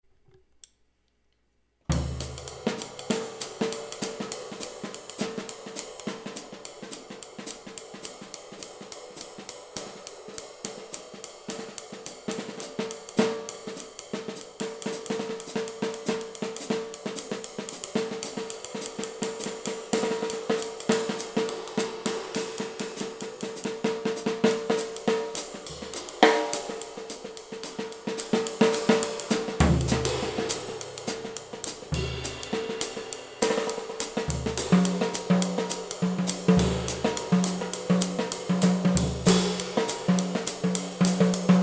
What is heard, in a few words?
gretsch